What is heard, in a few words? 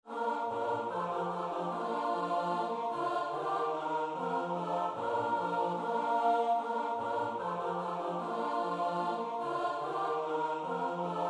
ding dong